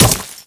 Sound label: punch stone